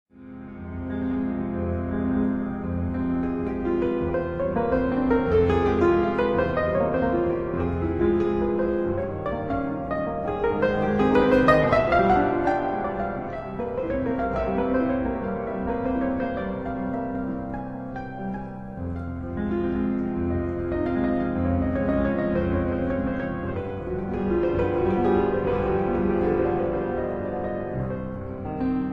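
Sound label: études for piano in F minor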